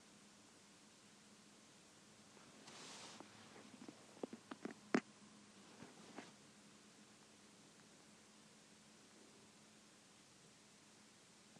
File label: silence test